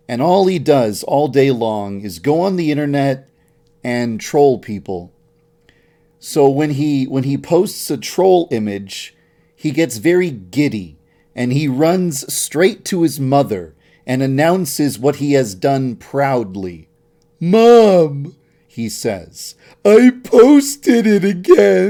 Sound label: chant